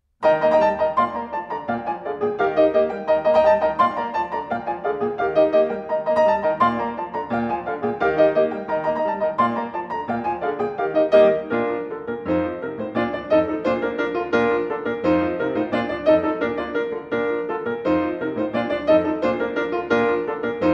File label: Galop For Piano In Eb Major; Glinka